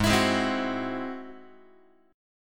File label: G sharp Augmented 7th G sharp Guitar; G#+7 strummed; x,4,7,6,4,5; JGuitar